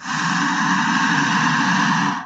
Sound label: asp calm